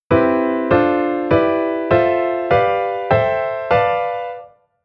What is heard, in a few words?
Chord Diatonic 7th